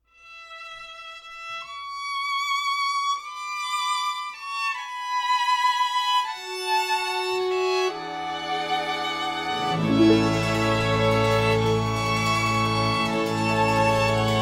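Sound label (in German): zäuerli mit polka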